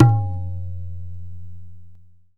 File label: "dhin", tabla